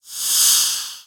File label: poison; kult